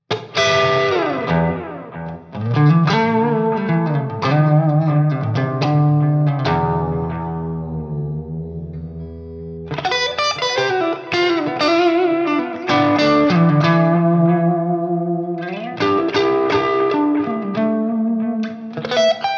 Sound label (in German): Gitarren Plug ins im Vergleich Guitar Rig 7 Breakup